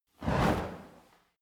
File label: fire torch whoosh fast; swooshes-swishes